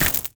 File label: walk stone